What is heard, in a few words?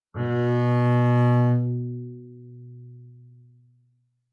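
Bass arco fortissimo sul G B2, Double Bass; MIS Pitches - 2014; Strings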